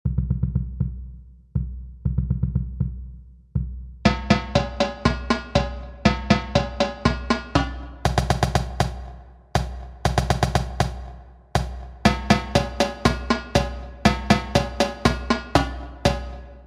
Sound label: bubbles